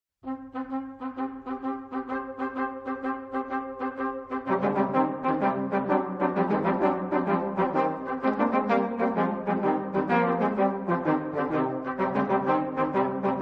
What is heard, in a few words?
tarantella